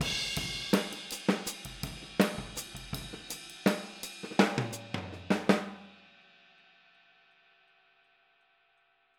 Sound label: Boogaloo - Outro - Hi-Hat, 82 BPM; Sonic Foundry; Drums from the Big Room The Mixes